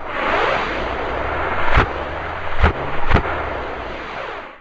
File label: portal beam shoot; Garry's Mod; hl2; citadel